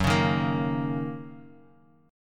Fsharp 6th down Guitar Drop D

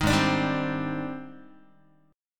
Ab Major Sharp 11th Ab down Guitar; AbM#11 strummed; 6,4,5,5,4,x

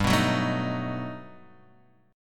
G Augmented Major 9th G down Guitar DADGAD

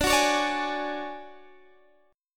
D Major 7th Flat 5th Guitar Standard, chord

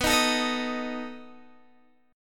B 7th B down Guitar Open D

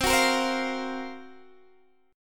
F sharp Major 7th Flat 5th C

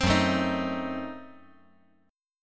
Gb Diminished 7th

